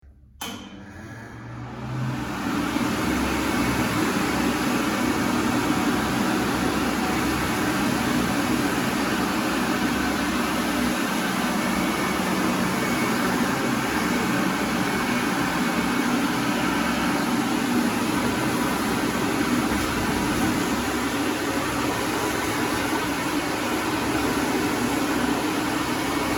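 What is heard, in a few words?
sushylka dlia ruk, hand dryer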